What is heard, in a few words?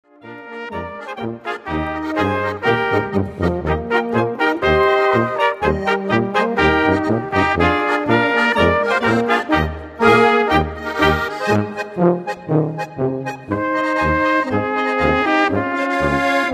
polka